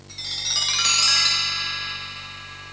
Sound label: bell sequence lo, percussion instruments; Sound Effects for Multimedia - Volume 1